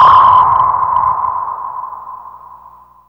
SONAR PING, Impacts, Hits & Booms; Distored Reality 1&2

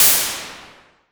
SNR, Roland SH-09 synth drums

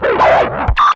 Blurp Hi, Hard & Loud Techno; USB Soundscan vol.01; AKAI

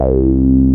A BASS; CMP - Analogon

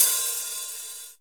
FUSION KIT; Northstar - New Gold VOL-1

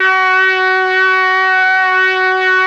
BRASS; ILIO; Propeller Island Legendary M400

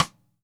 Radio King Cross Stick; Sonic Foundry (Sony Creative Software); Vital Drums

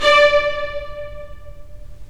STR, LA Composer; Roland - LA Composer vol. 4; Full Short

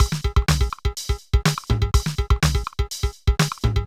Loop, mxr; classic machines

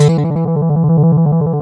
BASS TRILL E4; MX+BASS

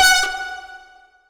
acid synth (F#); Sonic Foundry (Sony Creative Software); Ugly Remnants VOL-2

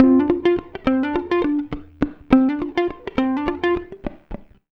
104 FUNKY, Electric & Acoustic Guitar Loops; USB Soundscan vol.04; AKAI

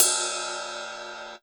DRMSND, Pure Electro, BEATKIT; USB Soundscan vol.03; AKAI

